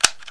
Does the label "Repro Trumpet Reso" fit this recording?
No